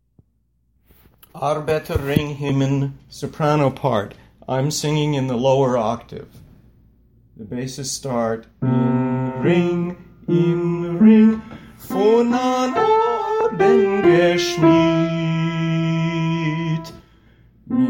soprano All the way through; arbeter ring himen

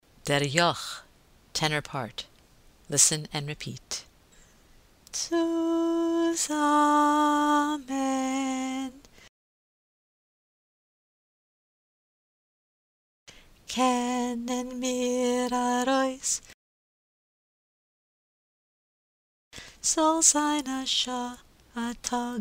tenor drill; Der Yokh

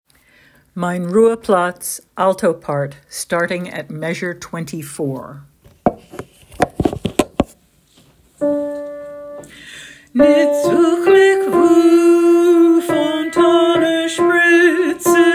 alto; Mayn Rue Plats; from m.24

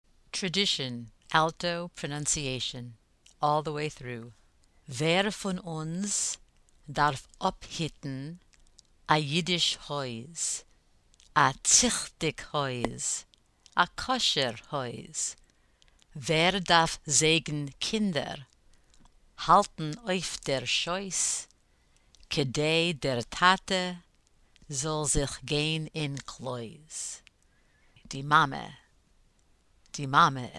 alto pronunciation; all the way through

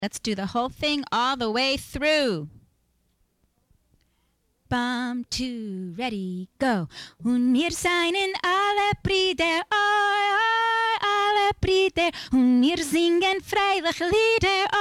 Soprano Runthrough; all the way through; Ale Brider

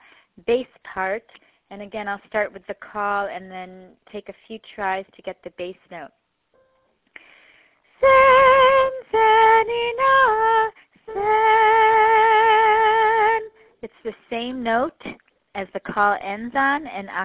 Bass, chorus; Senzeni Na

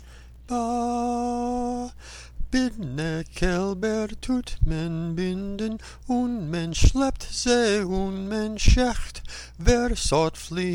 Melody

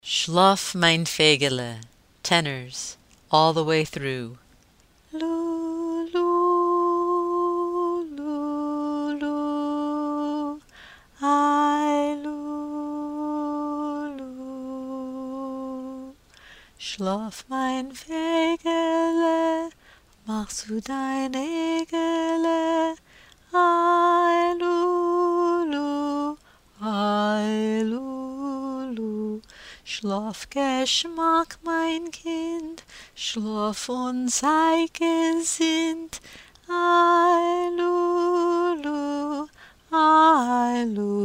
tenor; Shlof Mayn Feygele

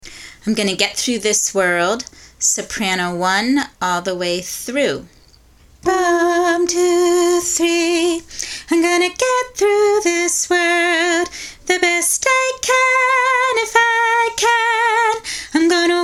Gonna Get Soprano 1

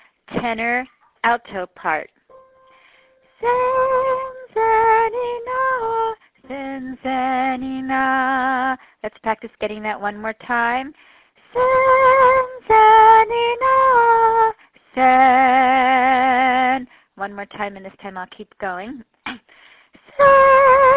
Tenor Alto; Senzeni Na

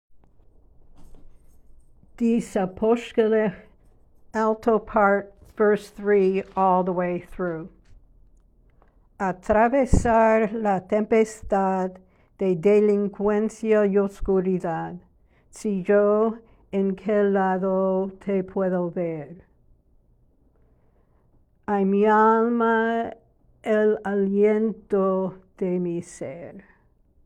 Alto; Di Sapozhkelekh; Spanish all the way through

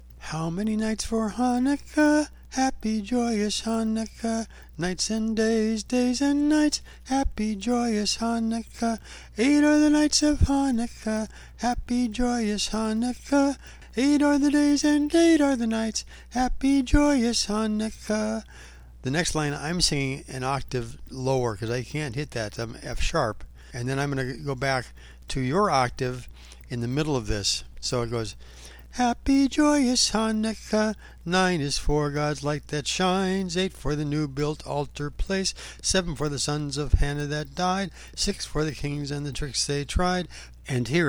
Tenors

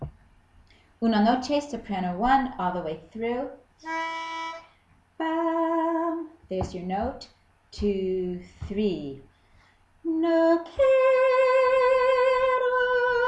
soprano I all the way through

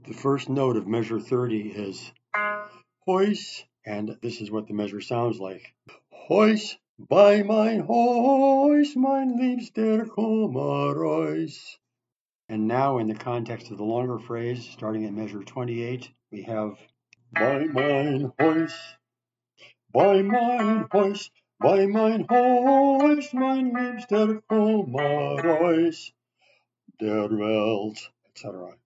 correction; Kum Aroys

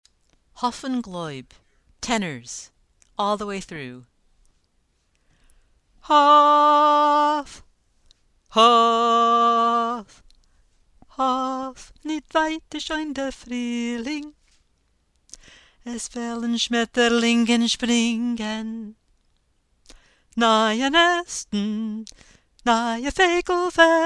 tenor, chorus; Hof un Gloyb

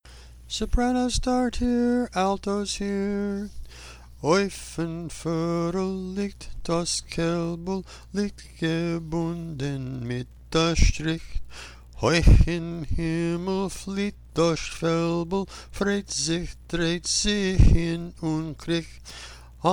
Alto

